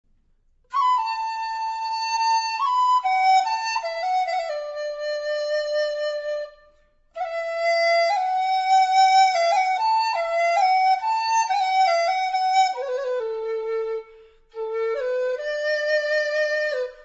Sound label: flute